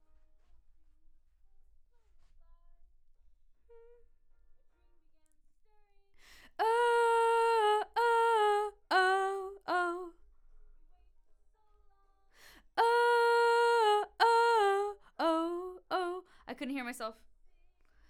Vox start new

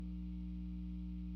GTR

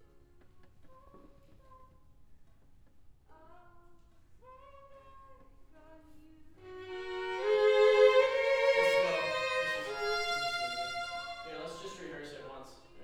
Cello

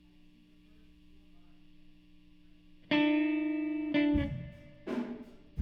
GTR

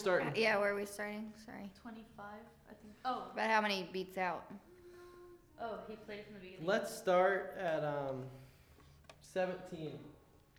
violin

